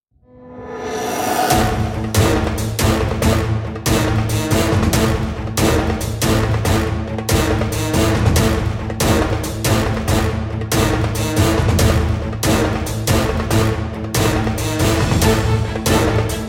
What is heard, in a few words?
Ingame Military Attack